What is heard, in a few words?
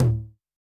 Electro Kit Tom